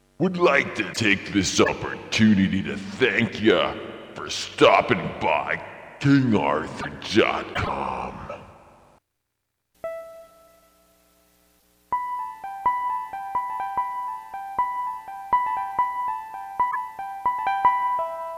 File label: Piano; live recordings